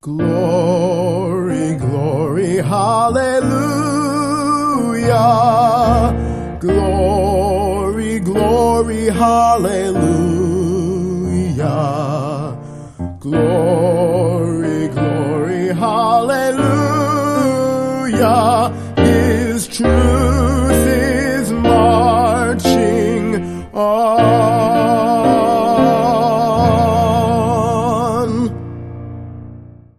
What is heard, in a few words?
Battle Hymn Republic; Trad (PD)